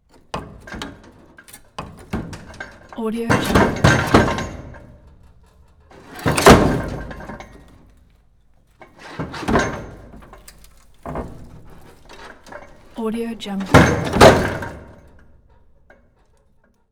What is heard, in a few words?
destroying a car crowbar; prosoundfx